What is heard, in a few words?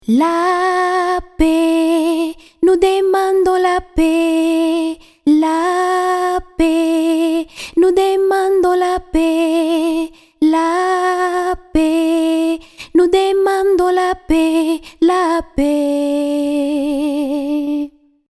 Vocals French